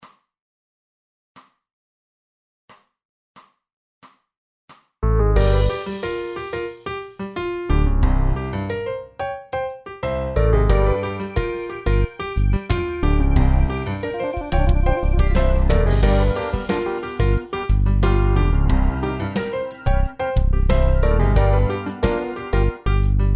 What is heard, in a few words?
Funk n Soul Song, piano